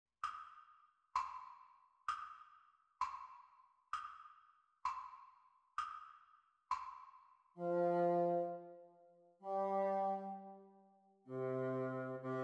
Bassoon Duets; Practice speed

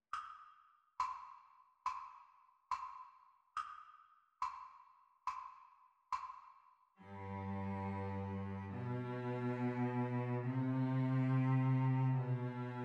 Cello Duet Playalong Practice Speed

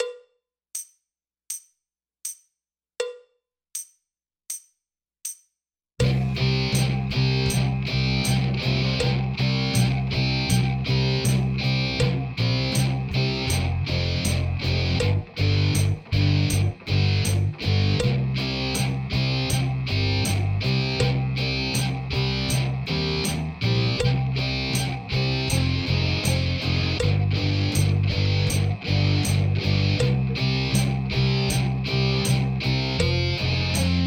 Drum Kit Toms playalong

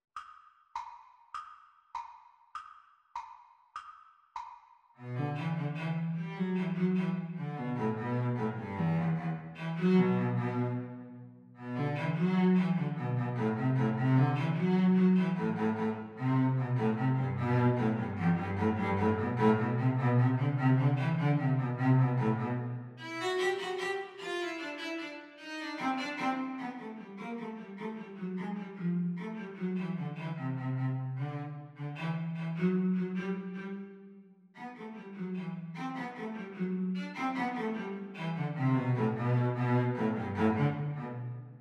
Cello Duet Playalong Reel Exam Speed; The Teacher part

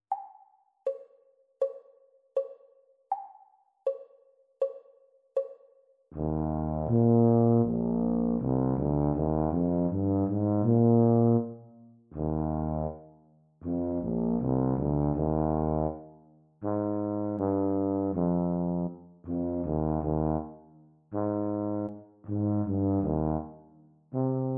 Eb Tuba Duet practice speed; Grade 4

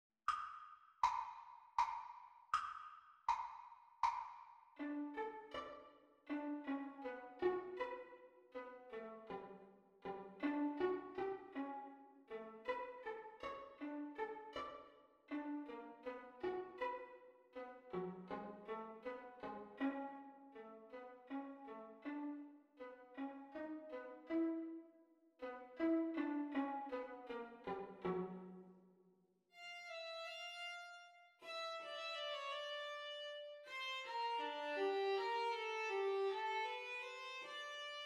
Viola Duet Playalong Exam Speed

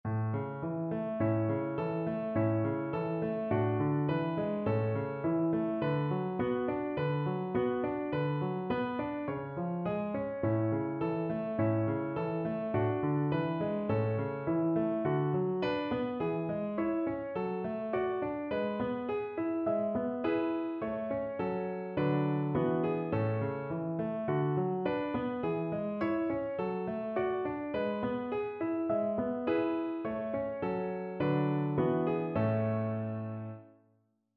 Skips of 4ths High voice Accompaniment; Grade 1; Lesson 2; MTB Singing Vaccai

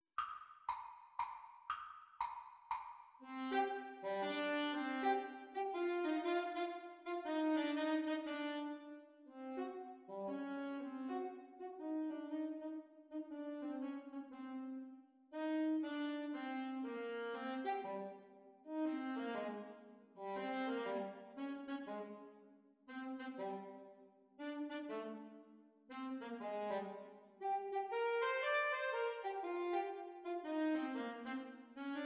Sax Duets Playalong; Grade 6; Alto Saxophone duets; Exam Speed

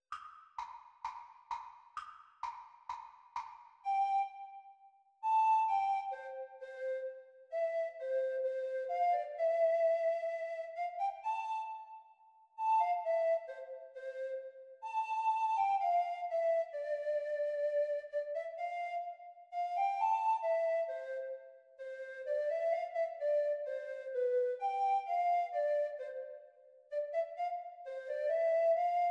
Treble Recorder Performance speed; exam speed; Grade 3

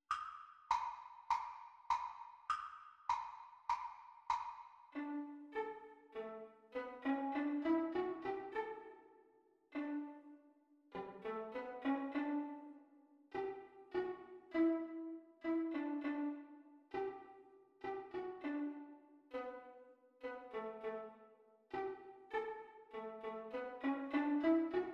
Viola Duet Playalong Exam Speed

